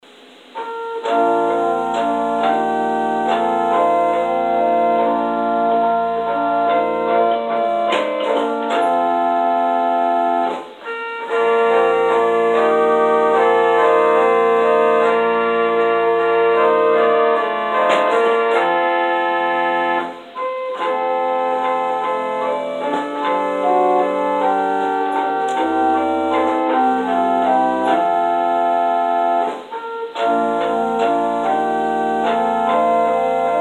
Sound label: Interlude